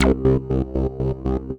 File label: Analog Bass; Ableton; Retro Synths; Analog Bass Vol 2